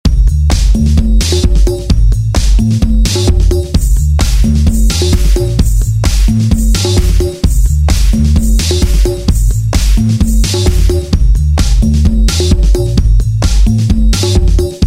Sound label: Bollywood Beats